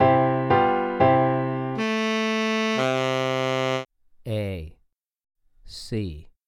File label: T Sax 2 Note Mel Maj a2 c2; melodic ear training